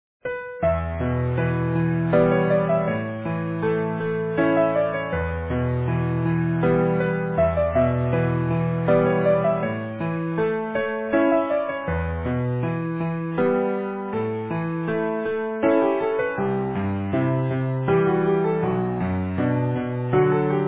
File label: Easy Piano